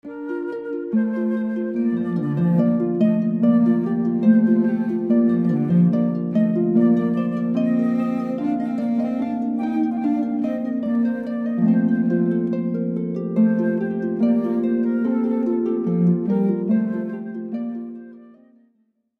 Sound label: Air, Handel, harp flute cello